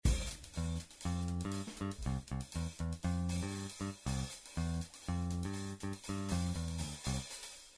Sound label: bgm musical instruments